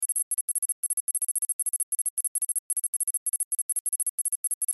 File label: powerup spawn; kaliber